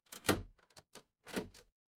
door lock

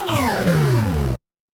retro game laser

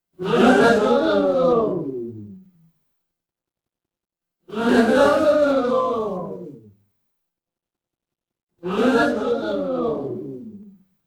crowd gasp

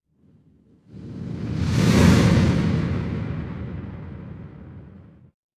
fire electric whoosh flame

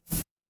ui power off

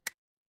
ui hover

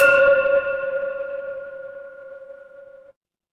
instrument singing bowl

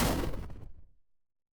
retro game explosion

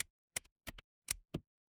ui typing

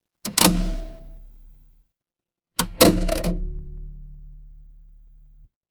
mechanical lever

